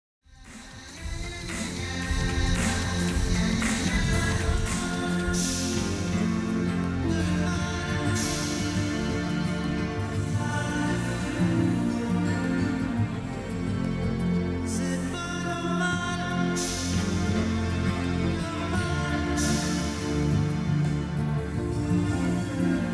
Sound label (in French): no man's land musique; mixage titres enregistres